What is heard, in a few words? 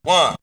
Vocal; ZERO G - Datafile DISC 2